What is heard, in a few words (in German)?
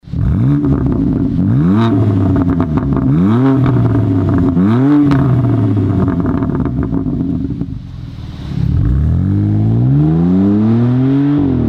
BMW M2 Competition REMUS Axle Back Klappen offen